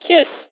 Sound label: kiss